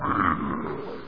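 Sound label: short inhuman roar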